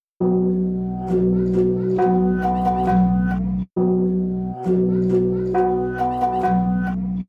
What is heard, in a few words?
Gong loop gong; alleen gong